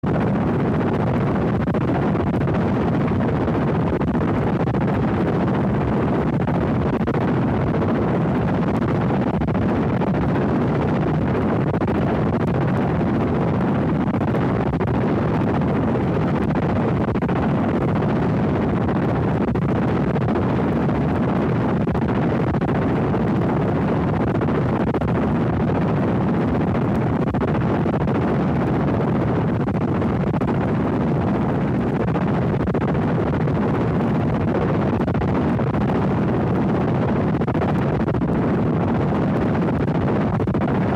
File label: Noise Electronic Music